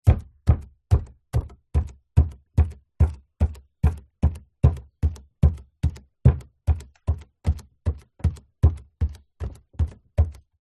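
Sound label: foley footsteps on wooden floor barefoot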